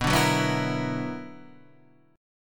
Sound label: B-Minor Major 11th; {7 5 4 4 5 6} strummed